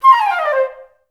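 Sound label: flute run down C3; Best Service; Peter Siedlaczek's Advanced Orchestra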